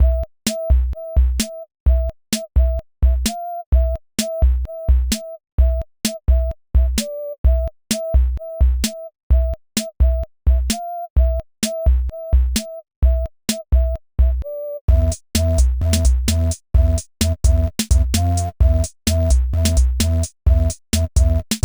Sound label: computer loop